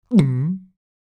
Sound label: Swallow gulp eating